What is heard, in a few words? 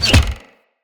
Player Bow Headshot Hit; Weapon Impacts; Sweeteners